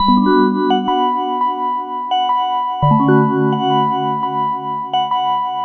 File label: Gong Loop; Drum & Bass